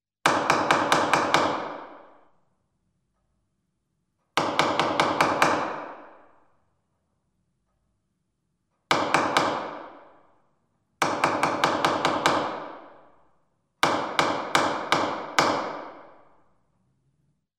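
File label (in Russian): судья призывает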